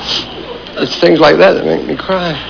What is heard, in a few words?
Cry